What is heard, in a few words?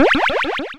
Cry